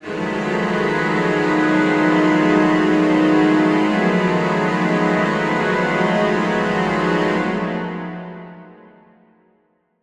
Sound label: Suspense Strings Mid, horror strings